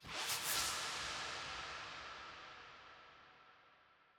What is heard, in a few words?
ambience room shadow room scary ambience